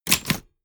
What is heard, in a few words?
typewriter